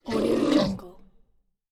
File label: strong hi tech alien growl